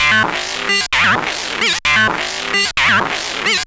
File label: MiniBrute Loop, 130BPM